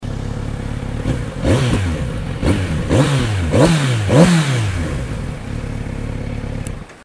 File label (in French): son z900rs